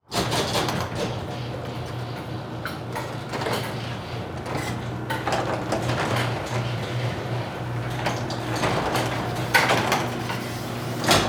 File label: Garage Close Door Interior; Household